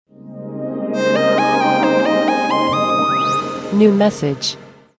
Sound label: Generic Sms